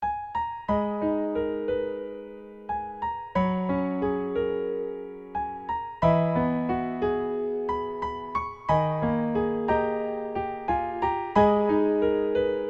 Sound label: last summer; 余命わずかな女の子が病院で最期の夏を過ごしているシーンをイメージした悲しく切ないピアノ曲です。